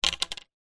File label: pipe pvc plastic drop ground